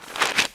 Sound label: stamp